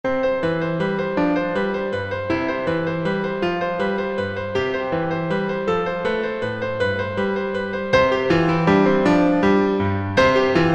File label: Piano Pieces Minuet